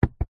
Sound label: car radio button press interior nissan patrol; vehicles